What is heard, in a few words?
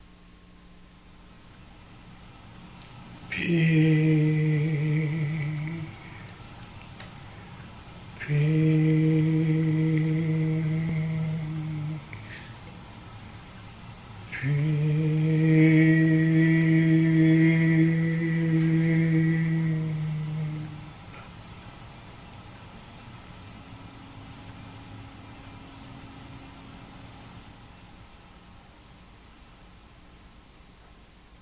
Peace chant